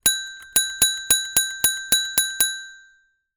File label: transport bicycle bell ring several times